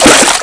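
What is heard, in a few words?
water in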